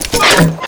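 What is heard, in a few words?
weapon foley rpg reload insert; Call of Duty Modern Warfare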